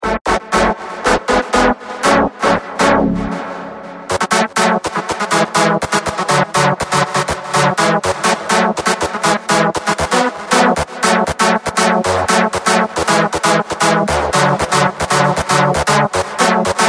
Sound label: BRIGHT TRANCE LD